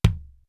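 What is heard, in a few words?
kick, HR-16 DRUMS; Greytsounds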